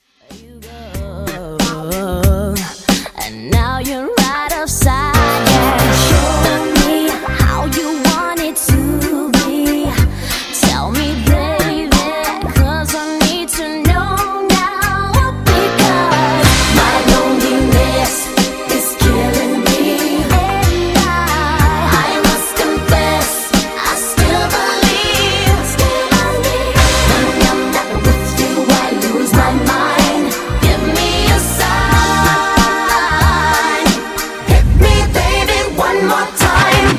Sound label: Wordplay Transition 93 126 Bpm